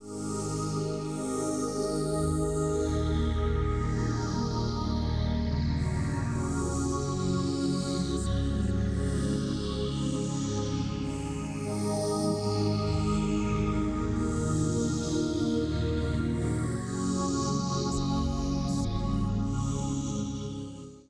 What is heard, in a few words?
PAD, Hip Hop and R&B; Best Service ProSamples vol.02; AKAI